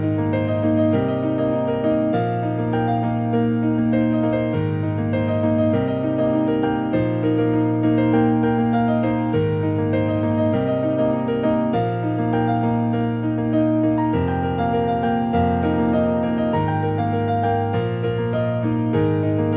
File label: REMIX